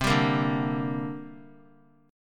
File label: C 7th Suspended 2nd C down; C7sus2 strummed; JGuitar